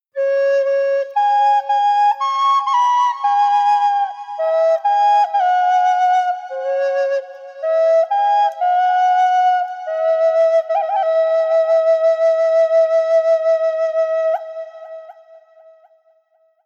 drift

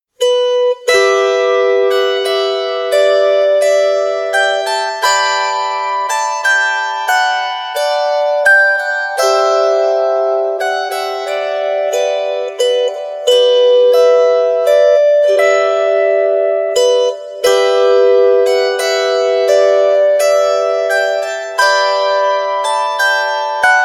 lap harp

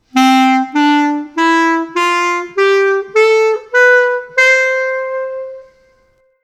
woodsax

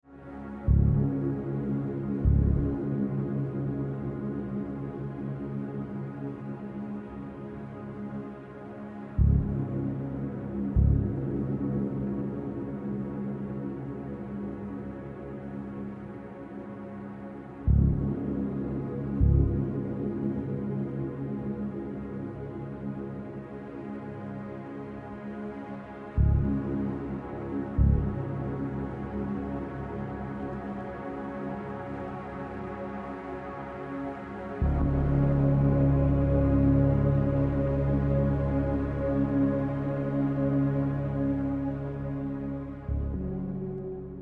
bgm

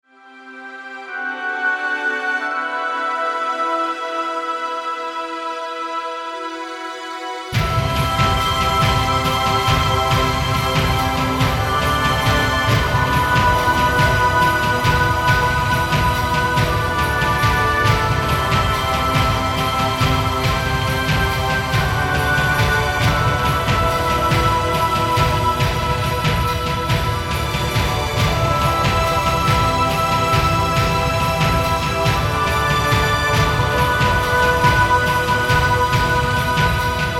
bgm

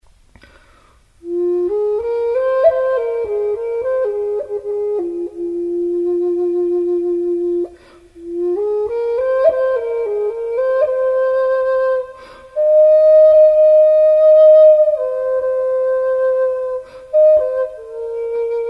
flute

